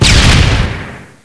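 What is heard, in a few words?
grenade launcher explosion; cstrike; codmw2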